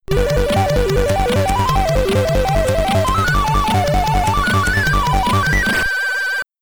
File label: Stage Clear (SID Stereo); Hammerfist; Commodore 64